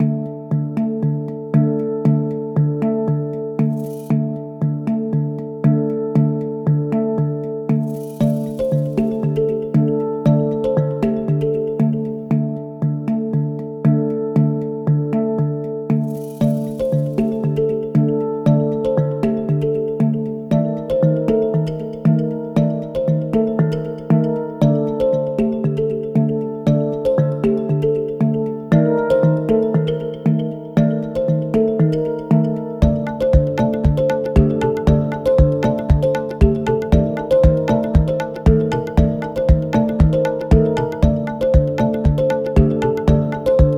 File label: Over Hang, Tension Mystery